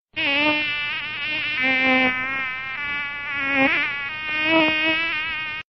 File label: Mosquito Sms